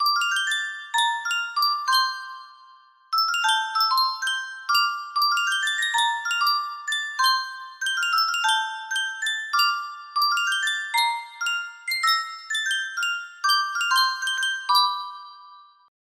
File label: sankyo custom tune music box